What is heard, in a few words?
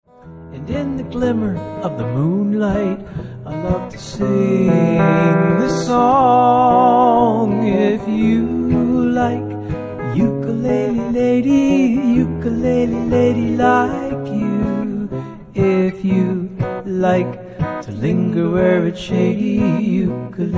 uke